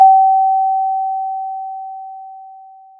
pop, Sound of a school bell

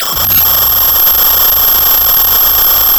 pop, Sound of a cat meowing

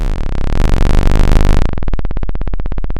Sound of a chainsaw

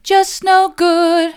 just no good soft, vocal

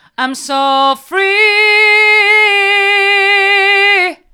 hard, vocal

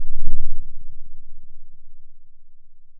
pop, Sound of a cat purring

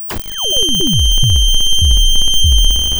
pop

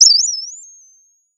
Sound of a bird tweeting nicely

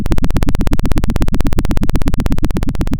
pop, Sound of a helicopter

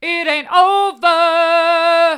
it an't over hard, vocal

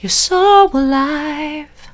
soft, vocal

